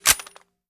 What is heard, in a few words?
reload empty carrier forward; gmod